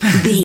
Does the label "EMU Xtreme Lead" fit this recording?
No